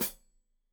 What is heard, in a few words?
Hat Foot, Hi-Hat, Cymbals Stereo